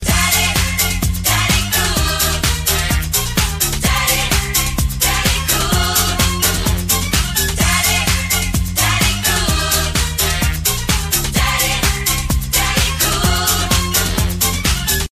disco ringtone

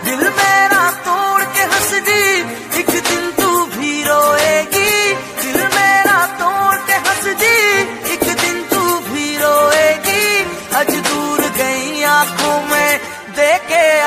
sad heart touching ringtone, bollywood

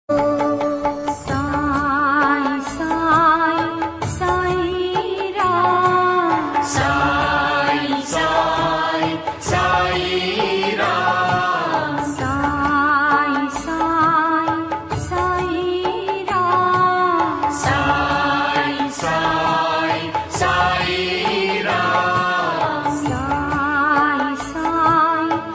bhajan, ringtone; saibaba

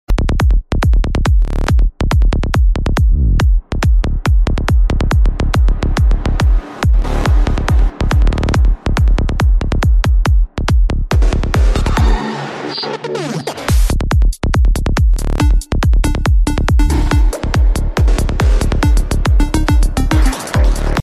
trap ringtone; aladin

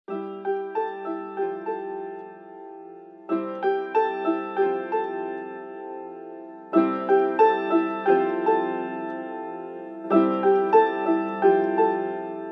gentle ringtone, nokia

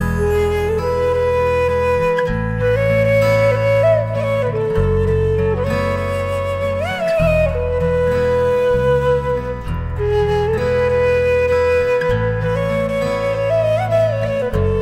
best flute ringtone

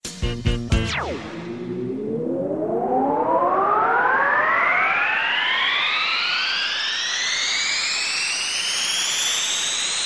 nexus s effect tone ringtone

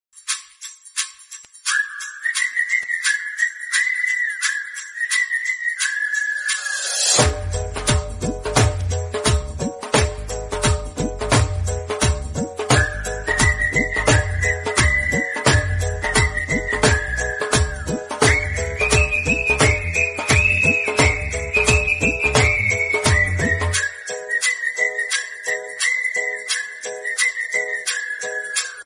cute whistle background music tone; bollywood ringtones

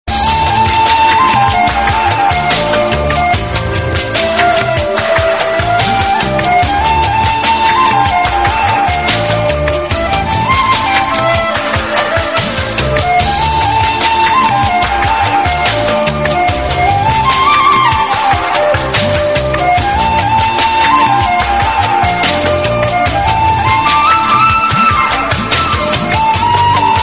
cool flute dramatic music tone

